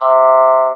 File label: Talk Box AH